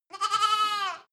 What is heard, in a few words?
goat lamb baa close